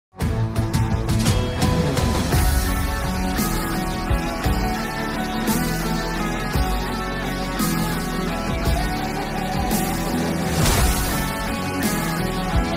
hindi movie bgm ringtone theme music background score; jersey